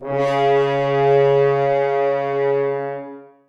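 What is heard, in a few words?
horns sustain c#3; Sonatina Symphonic Orchestra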